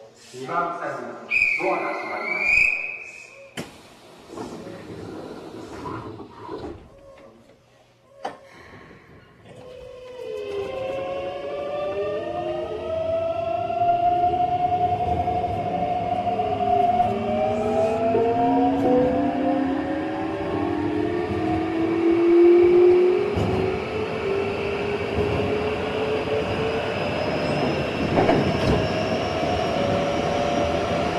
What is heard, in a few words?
vvvf, mitsubishi; keikyu 600